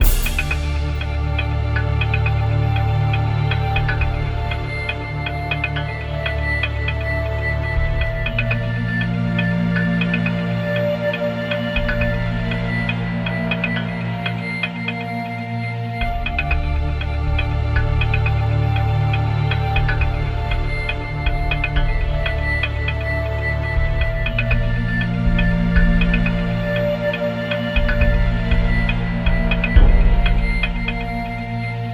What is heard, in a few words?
Snow Level loop; Rezrog; Depard; Gems of Rezrog